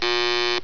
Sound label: wrong